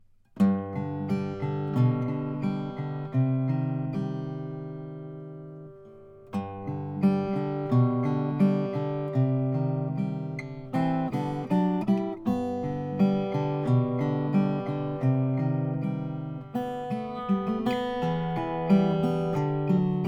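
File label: froggy bottom l 12 parlor bastogne, guitars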